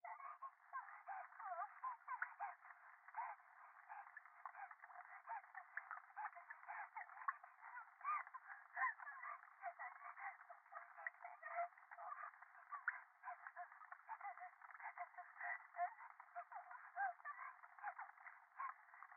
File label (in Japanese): 電話 可愛い喘ぎ 雨上がり, ASMR